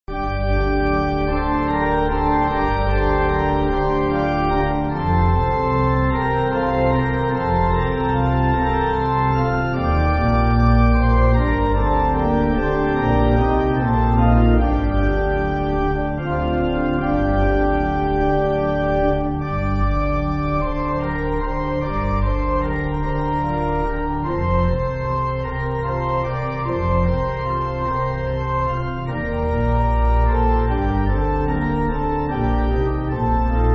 Kyrie, Pipe

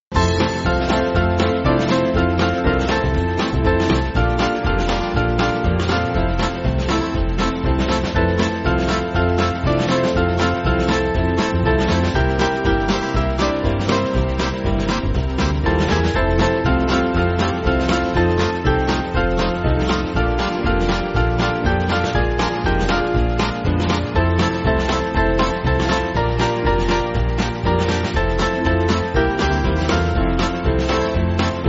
Band

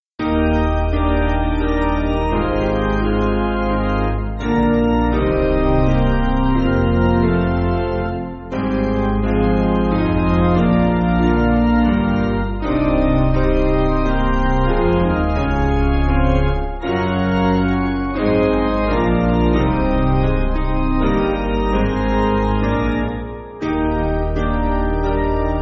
Piano

